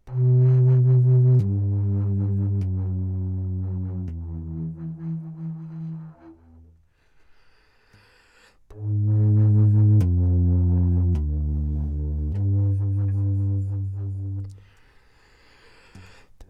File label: flute octobass; bernard wystraette